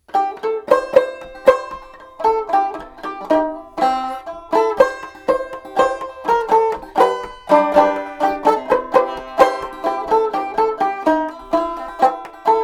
steel

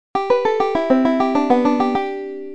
Arkansas traveller NON RIGID

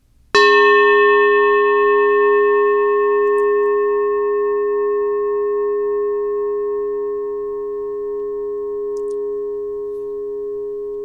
one tap each

